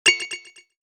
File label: exclamation, message tones